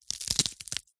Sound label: horror nose break snap; zapsplat